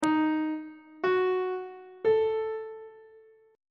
e ionian chord 7 is d sharp diminished; on treble clef

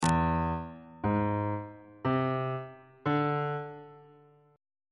f flat augmented 7th chord intervals on bass clef

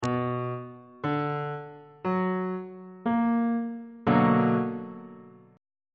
a double sharp minor major 7th chord

